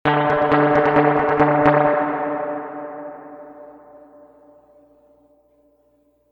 reverb tank 2 spring short staccato

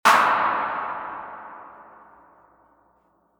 reverb tank 3 spring medium drum machine clap

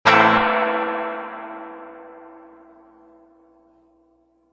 reverb tank 3 spring short quick strum

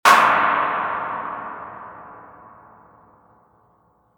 reverb tank 3 spring long drum machine clap

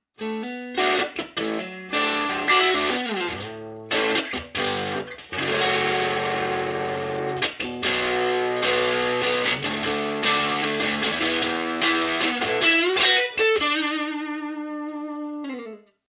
overdriven

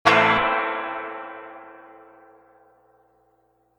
reverb tank 3 spring medium quick strum

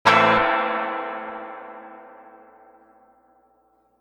reverb tank 2 spring long quick strum

